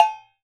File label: Pan Clank, household rhythm samples Hits